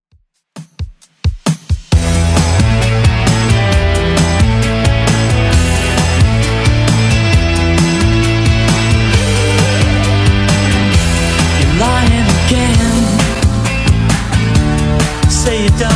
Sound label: 90s 133 bpm; Redrum